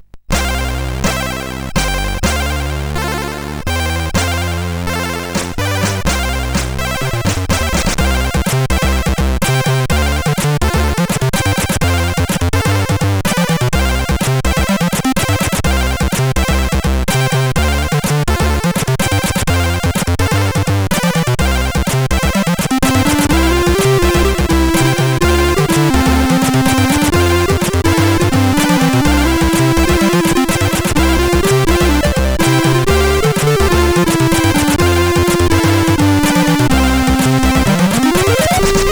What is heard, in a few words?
Loader, sid CSG8580R5; Chiller 2